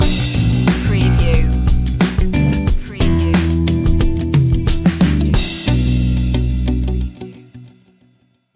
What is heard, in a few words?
slow funk reggae stinger